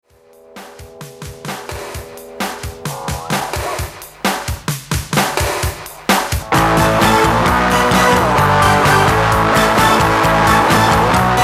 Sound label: rock percussion sample backtrack; classroom music programs